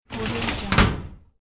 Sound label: file cabinet drawer slide closed; electronplanet